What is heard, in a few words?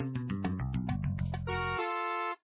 Customer Upset; papas cupcakeria online windows gamerip 2013